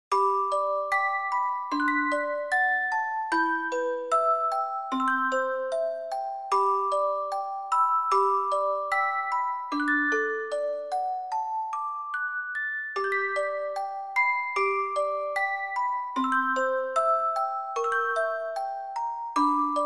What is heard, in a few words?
wedding ceremony